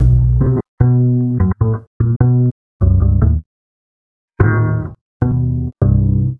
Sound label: Standup Bass, Drum N Bass